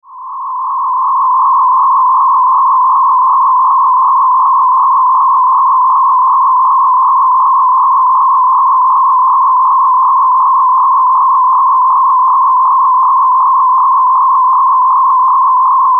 Drone High Frequency Swirling Pulse; Blastwave

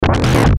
Glitch Low Distortion, Glitch Low Beeps; Production Element Imaging Element Accent Transition; Blastwave

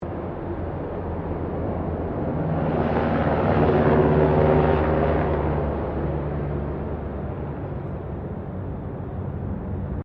Helicopter Eurocopter AS350 Squirrel Passing; Various commercial helicopters

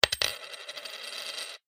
money coin drop, Coin Drops Onto Table; Coin ( Single ) Drops Onto Wooden Table; foley; Objects; McKinney Sound

